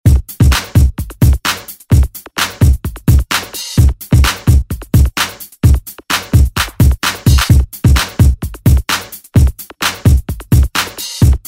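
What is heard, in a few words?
Reggae Extended Redrum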